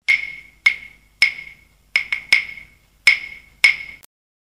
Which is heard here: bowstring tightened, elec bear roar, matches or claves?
claves